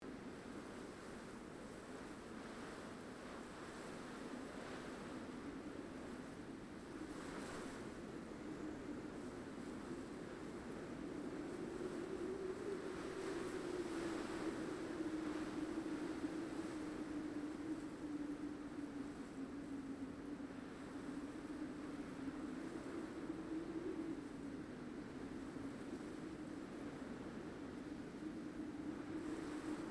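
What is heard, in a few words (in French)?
ambience vent; deadmaze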